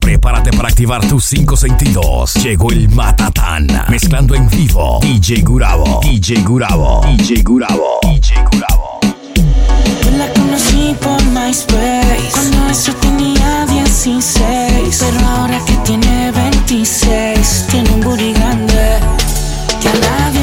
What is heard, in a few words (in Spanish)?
REGGAETON MIX